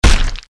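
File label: weapon sword heavy flesh; conan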